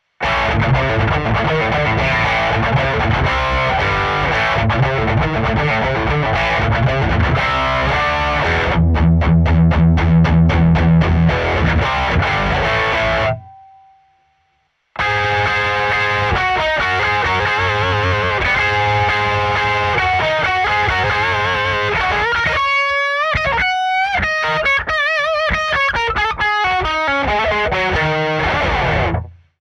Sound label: tone fender hard